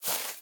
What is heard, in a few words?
Player Walk footsteps grass Walk